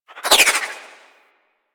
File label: Arch Wing Dodge Roll Left, Vehicles; Movement; Warframe